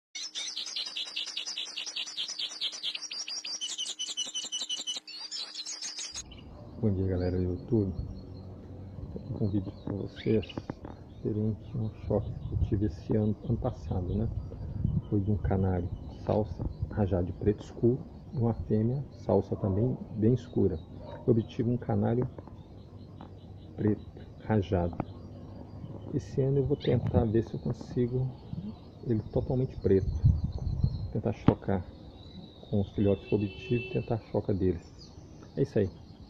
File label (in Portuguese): canto do canario belga preto